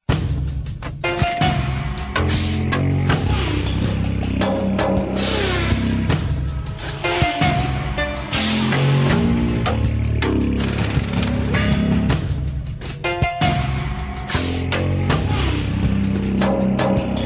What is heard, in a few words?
Character Select; Mortal Kombat Armageddon; Motor Kombat